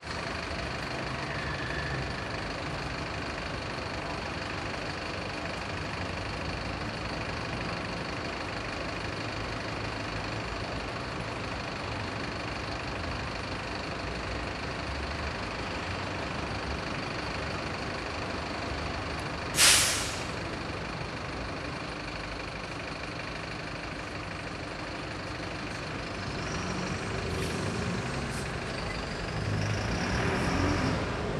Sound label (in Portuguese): Onibus Parando e Saindo em Ponto de Onibus; maquinas